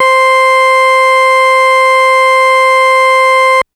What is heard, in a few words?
Organ Farfisa c5; TrashLibrary